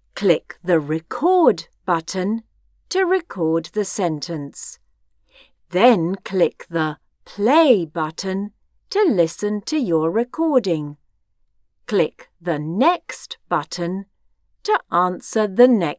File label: challenge bg